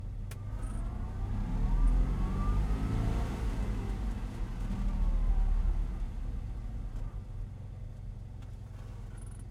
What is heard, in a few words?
fiat500 reverse medium speed reverse 2x hesitant deceleration shut off cabin